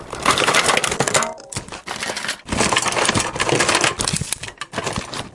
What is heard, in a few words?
rummaging noises